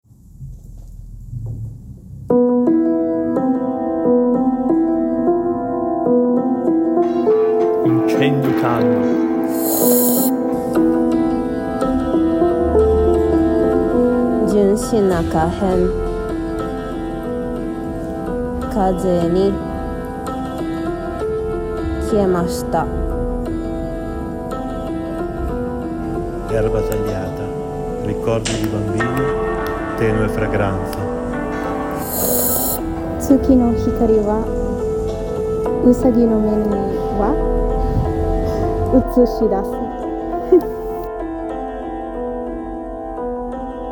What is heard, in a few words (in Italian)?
haiku piano preparato pentatonic scale; mix cultura materiale; JP Pentatonic Scale in interaction with MAO’ students